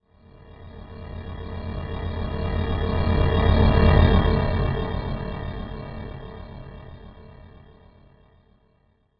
Hover Craft By Slw Lo, spaceship fx

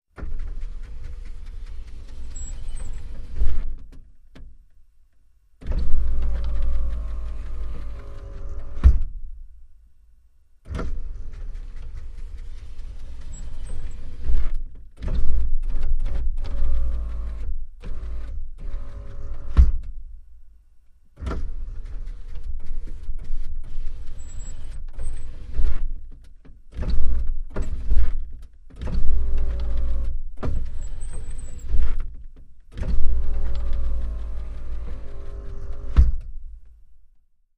Jeep Wagoneer: Electric Window Open and Close.

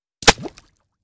Bullet Impacts Water

